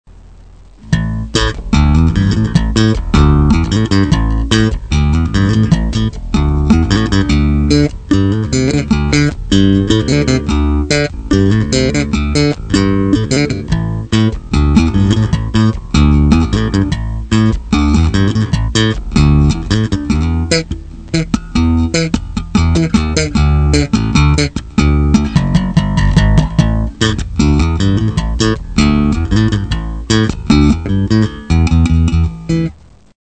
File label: Bassgroove